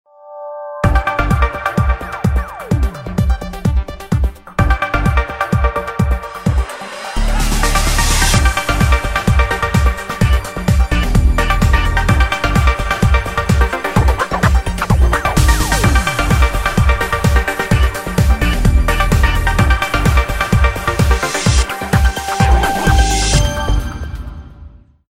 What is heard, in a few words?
A beat for me, ringtones; Samsung Galaxy S GT-i9008